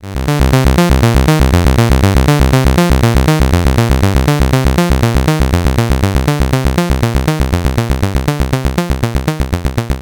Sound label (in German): VCA Exponentialisierung